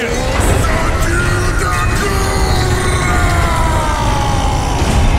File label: vrykul battlecry